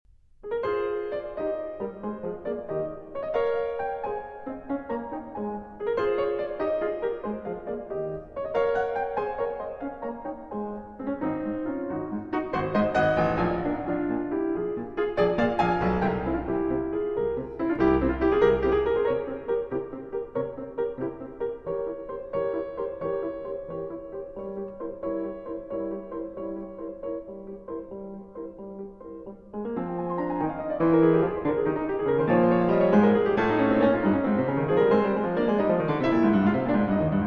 Piano Sonata No. 12 in A-Flat Major, Op. 26 Funeral March II. Scherzo; Beethoven; Allegro molto